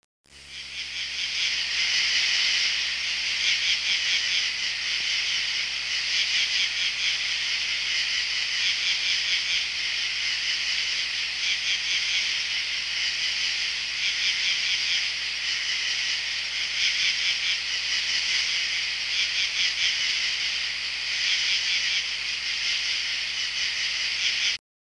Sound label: dusk, forest; ambient